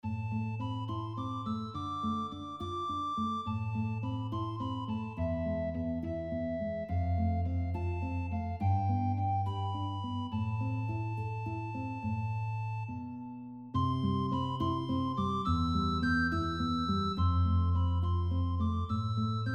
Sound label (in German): Duostucke Sopranblockflote Gitarre